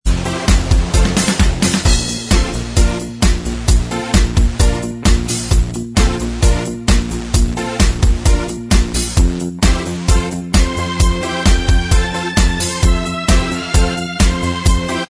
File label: Inst